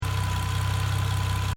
Engine: Tick Over Revs Loop, car; Cars; Vehicles; Stuart Duffield

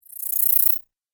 Insect Whoosh; Whoosh Sound Effects

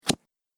Cards Card Placed; Card Sound Effects; casino sound effects

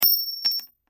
Screw Drop, Screw Drop On Cement Nail; Hardware; Industry; Machines; Blastwave

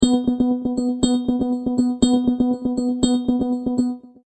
Multimedia Tone-Thinking/Processing

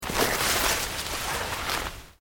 Plant Leaves Large Move Slow

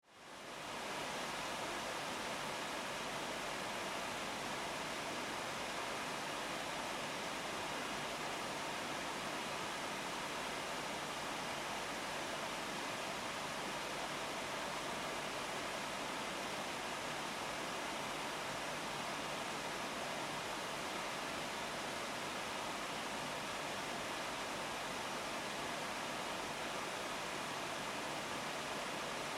Household Microwave Fan Low; Appliances; SFX Bible

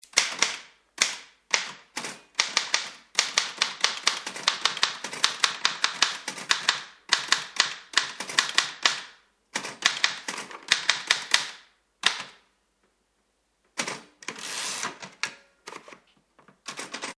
Typewriter Old 1920s - Typing with carriage moves; Machinery; Tom Hutchings

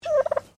Bird Turkey Domestic Call; Birds; Animals

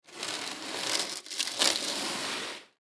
Chains Drag Floor; Dragging Sound Effects; Foley; Objects; Blastwave

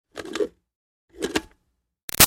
Switch Plastic Bubble Machine On-Off Light; Switches; Objects

